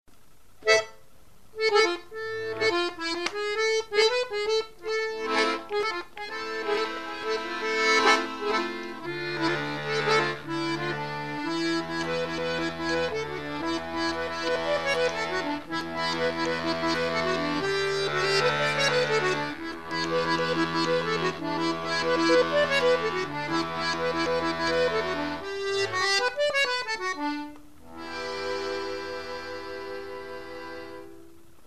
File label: accordion